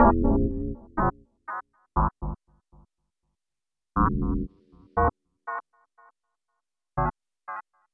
Tape Arp

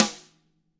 DW RIM2 FD-L, DRUMS SNRD; ILIO; Double Platinum Drums 2

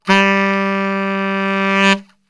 G 1 SAX, Brass Super Section; Best Service

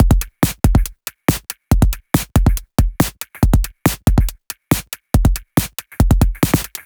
Break Beats, 140 BPM; Vengeance - Essential Clubsounds Vol.4

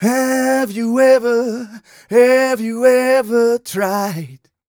130 mel g, Vocals

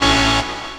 chord A; Techno Trance Essentials; CHORDS

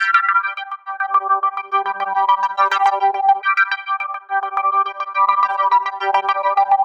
Arp 140bpm G, Trance

